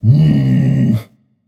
tongue; smite; tsukuyomi

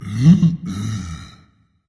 content berserker; cuchulainn

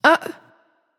surprised; smite; scylla

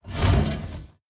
armor heavy; smite; god atlas

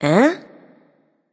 confused; smite; pele

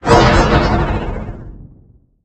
projectile; smite; god scylla

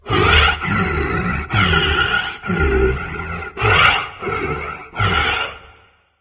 breath; smite; thanatos

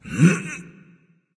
lift grunt; smite; ahpuch

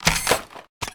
defeat crash; smite; zhongkui

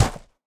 footstep; Smite; god Kuzenbo v2

